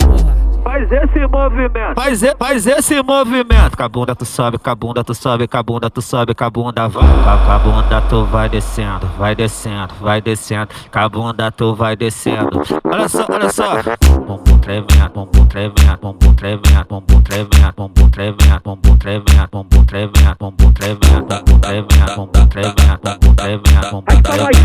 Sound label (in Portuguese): funk sertanejo